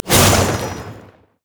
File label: vicious strike; olaf